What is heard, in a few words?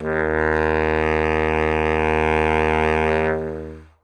notes e flat, sax; Dirt-Samples